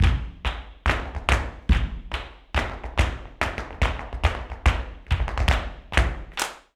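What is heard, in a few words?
percussion, FARRUCA TAP, Flamenco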